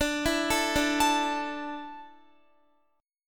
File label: D Suspended 2nd D, down, Guitar; Dsus2; arpeggiated